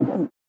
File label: Drink Loop